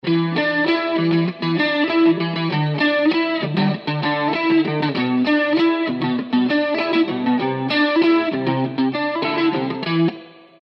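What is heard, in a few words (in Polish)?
gitara elektryczna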